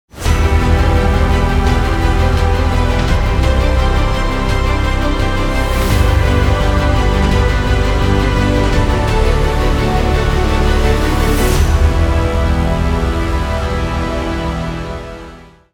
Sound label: dramatic background